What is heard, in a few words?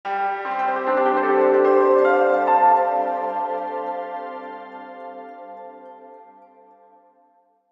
Musical Piano; eternal edge